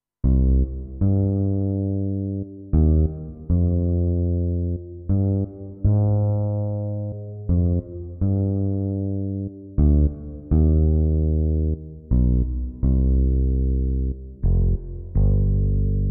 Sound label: Fretless Bass